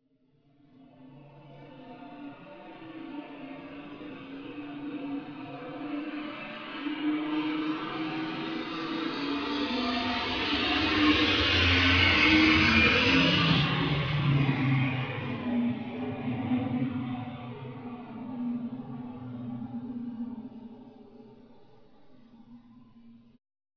moto

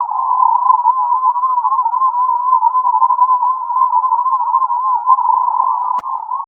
sine freq mod

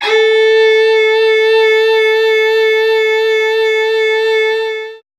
violin traditional

